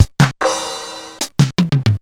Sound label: Estradin Pulsar